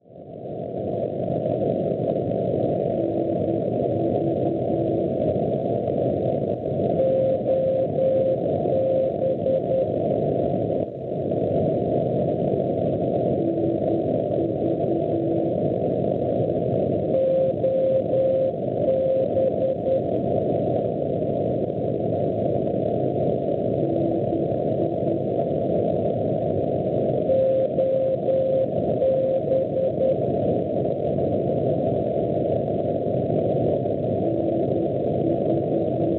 NDB, 394 kHz; NV; Nevers; Francia; Schwarzsee

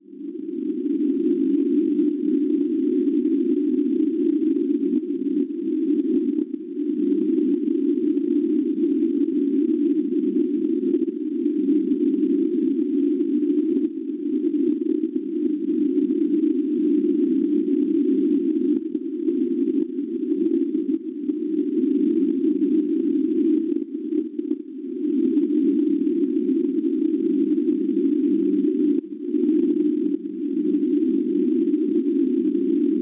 NDB, 309 kHz; LG; Satenas; Svezia; Schwarzsee

